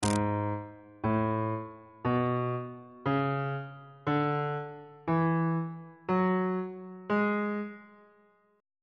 a flat locrian mode

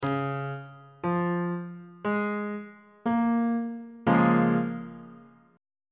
d flat major 6th chord on bass clef

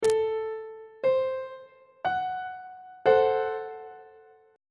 f sharp diminished 1st inversion on treble clef

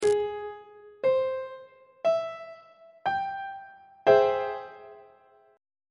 g sharp augmented major 7th chord on treble clef

